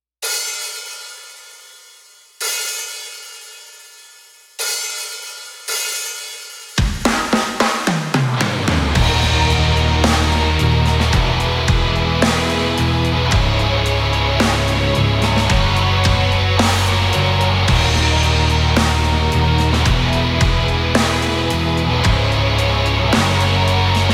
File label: karaoke